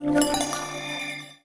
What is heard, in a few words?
chat start new; Nokia N900